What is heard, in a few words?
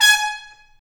BRS TP STA; Stabs-Swells; Roland L-CD702